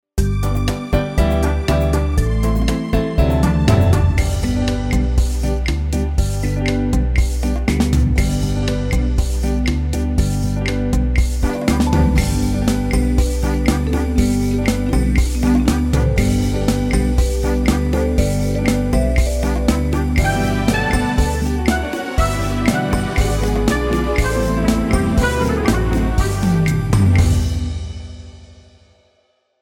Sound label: rumba